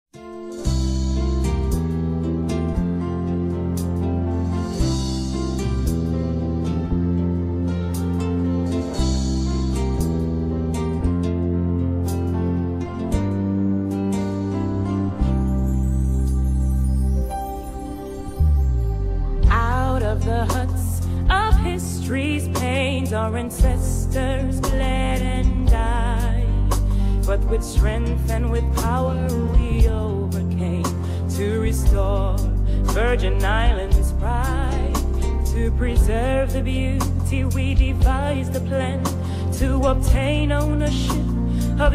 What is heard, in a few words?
anthem british virgin islands